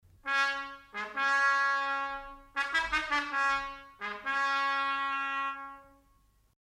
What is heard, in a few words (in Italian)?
trombone sordina; strumenti